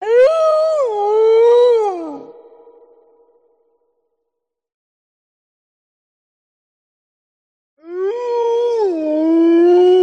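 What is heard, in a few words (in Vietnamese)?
4 bien the cua tieng hu cua mot con soi don doc, wolf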